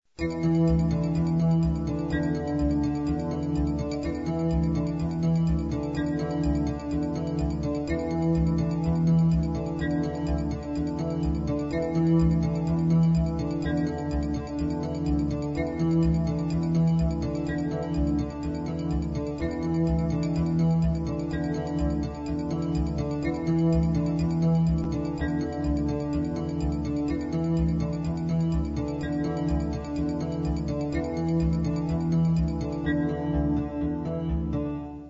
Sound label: News Music